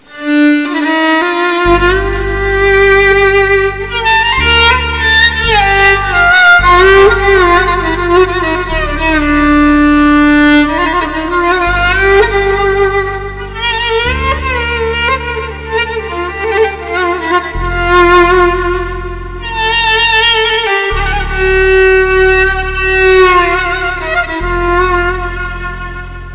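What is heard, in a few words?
arabic violin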